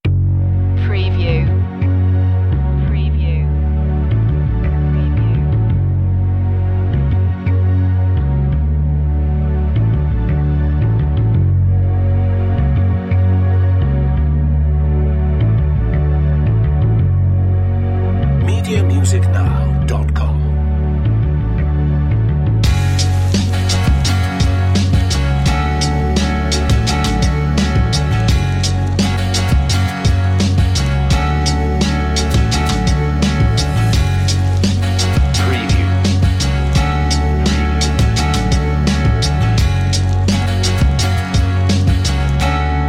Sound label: like a bird flying high remix instrumental